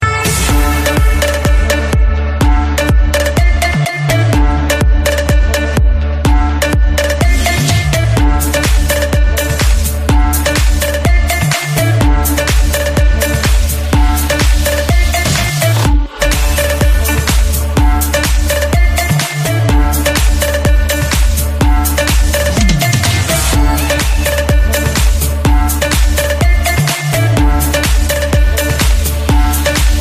Magical Place

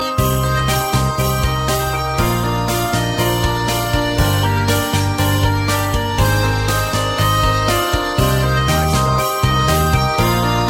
French Christmas

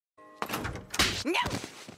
Door hits; Norio

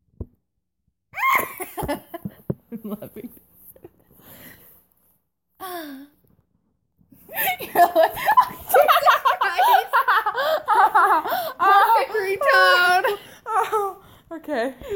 moaning

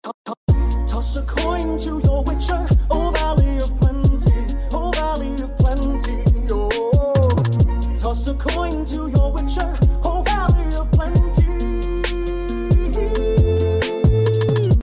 Remix

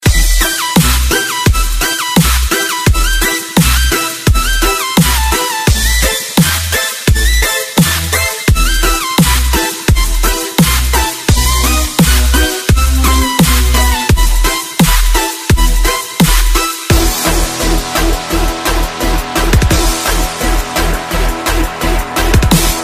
Funny Dubstep

